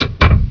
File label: train; quake1